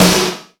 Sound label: SD, Yamaha RY-10, Drums